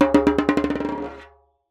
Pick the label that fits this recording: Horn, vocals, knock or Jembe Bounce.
Jembe Bounce